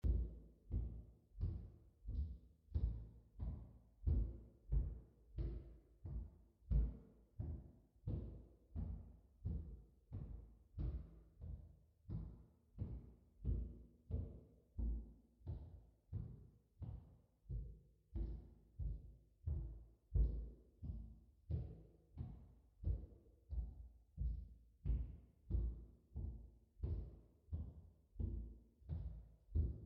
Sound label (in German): Trittschall, Schallschutz